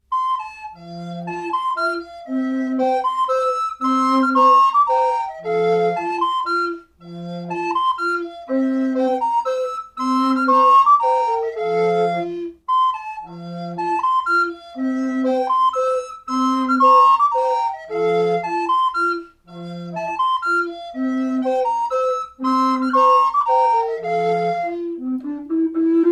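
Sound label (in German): Blockflötenquartett Landler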